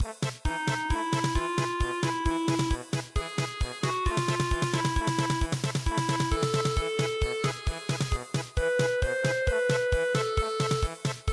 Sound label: kuroi me polka